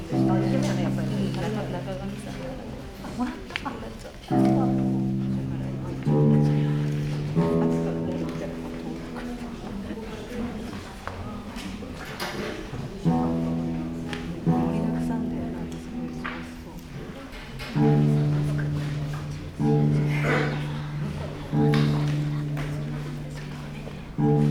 tuning; ZOOM M4 MicTrak; 内蔵マイク; 48kHz; ZOOM F6 MultiTrack Field Recorder

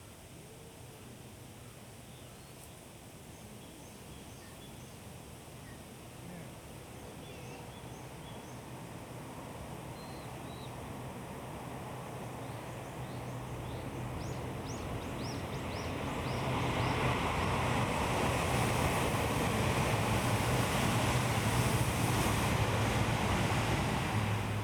Binaural, walk; Fild recrding walk

